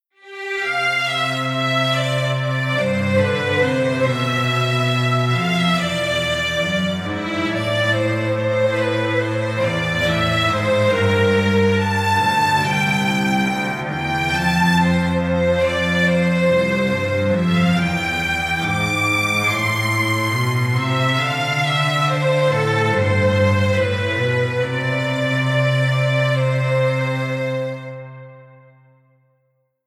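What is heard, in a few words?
Strings Polyphony Bass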